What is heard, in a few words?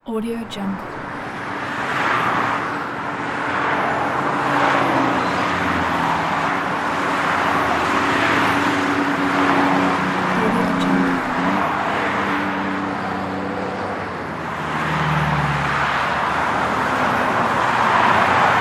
highway